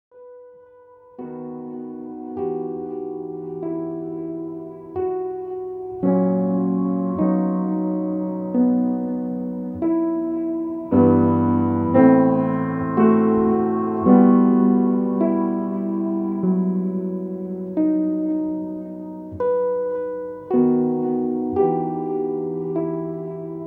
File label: Prelude in E minor, Keyboard Studies